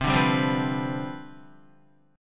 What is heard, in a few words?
C Major 7th Suspended 2nd Suspended 4th C down Guitar, chord; CM7sus2sus4 strummed; JGuitar